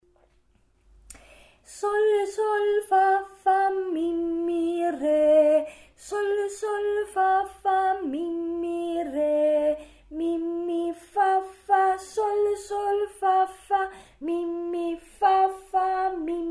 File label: mi violin